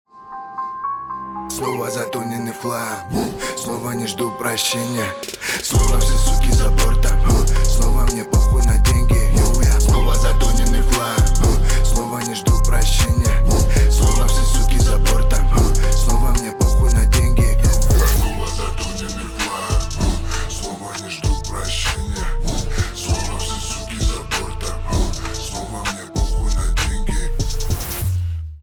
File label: russian vibe